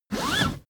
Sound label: car seatbelt pull fast